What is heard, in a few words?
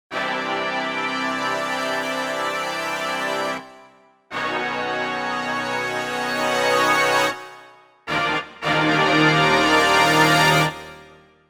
Brass Swell, Roland JV-880; Factory Patches